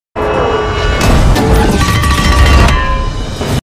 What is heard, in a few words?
Hammer; Hammer of Thor; چکش ثور